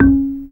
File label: GAMBANG C#4; Spectrasonics; Heart of Asia